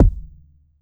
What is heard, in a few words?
big kick drum g sharp key